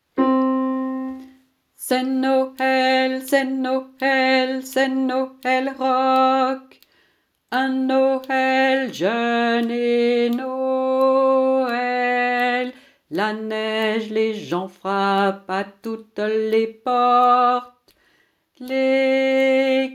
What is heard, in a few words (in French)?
c'est Noel rock hommes chante